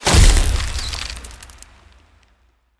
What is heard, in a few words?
bonus Arrow Shot Ice Hit; netent